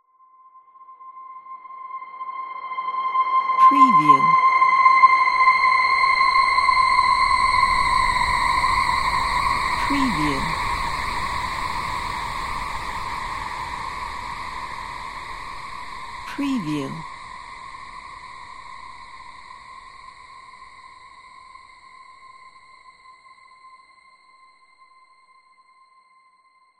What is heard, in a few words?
scary forest